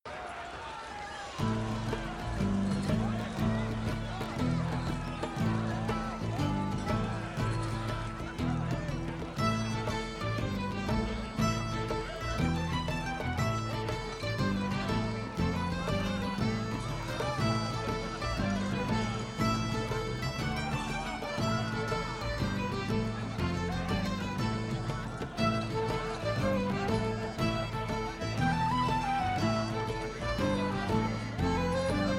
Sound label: tavern; PhoenixCraft-1.16.5; ambience music